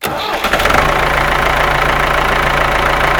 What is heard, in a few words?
tractor start loop; vehicles